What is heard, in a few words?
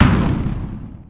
explosion